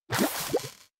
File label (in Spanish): Grito